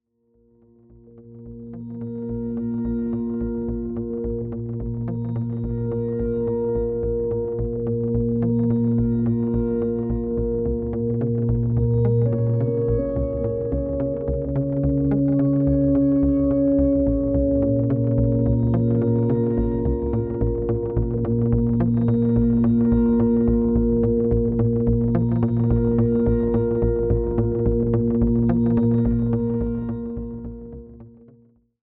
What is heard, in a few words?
Ambiance